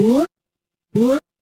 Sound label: retro game jump